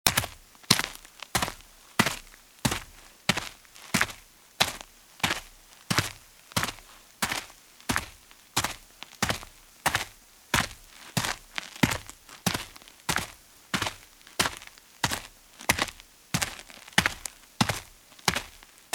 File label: footstep, Footsteps; BBC 55 - Footsteps 1; BruitsDePas